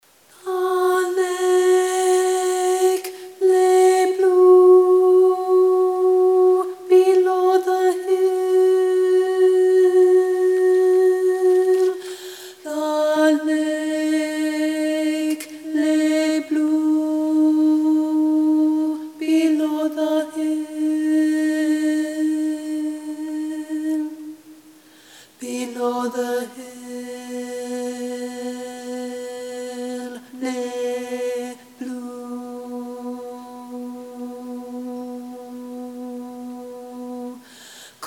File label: The Blue Bird Alto; Lent/Holy Week 2013 Rehearsal recordings